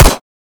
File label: fire, m16 weapons